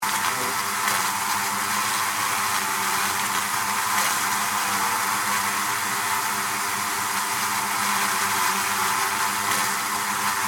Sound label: Bee Hive, Animal, Bee, Hive, Wings, Buzz, Insects, Bugs; Bees